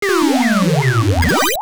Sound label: Death; puyo puyo tsuu system c 2 arcade